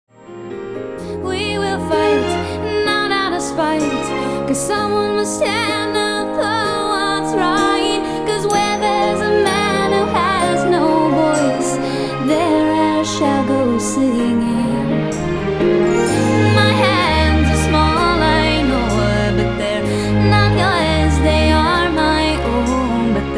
Orchestra

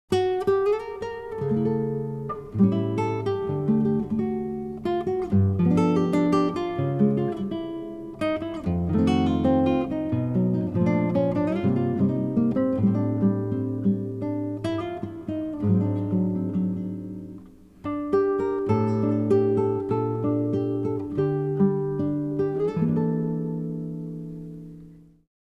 Acoustic Guitar

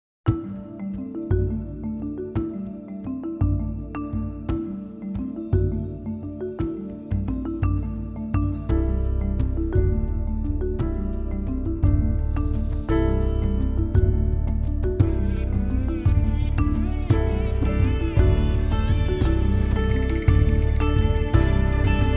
Percussion

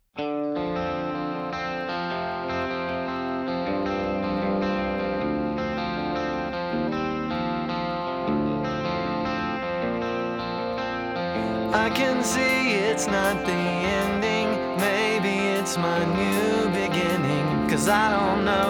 Electric Guitar

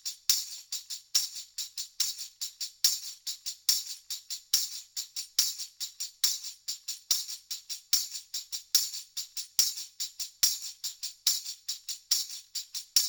Tambourine

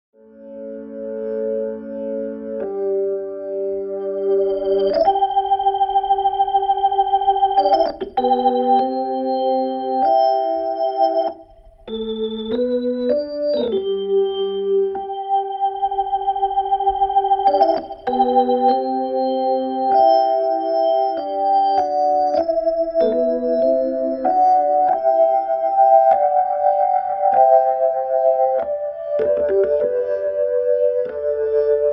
Piano Accordion B3

